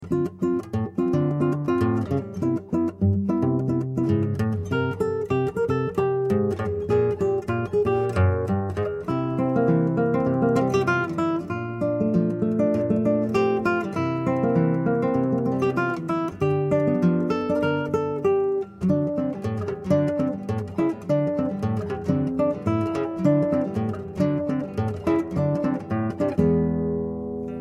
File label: Bossa Blues